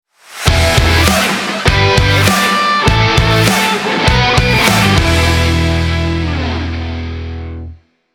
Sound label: stylish rock logo